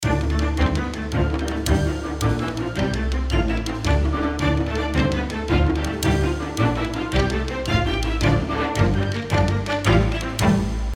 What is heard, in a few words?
Lively fighting Stinger; Shuyan Saga